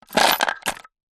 jewelry and small makeup items place into wood container